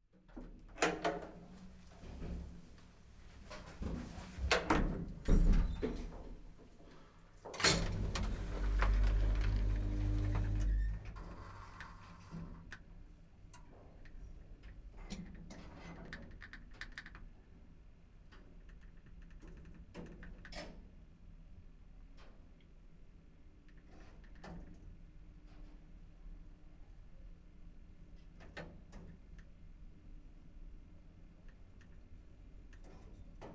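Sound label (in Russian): подъезд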